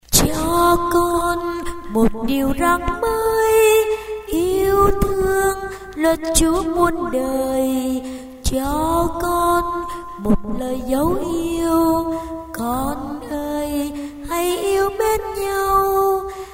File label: alto basso